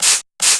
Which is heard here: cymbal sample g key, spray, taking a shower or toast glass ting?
spray